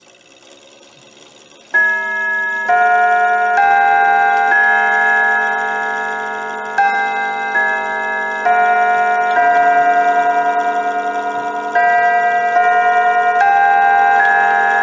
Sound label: gongs, clock